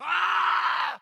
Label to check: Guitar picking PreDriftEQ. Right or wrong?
Wrong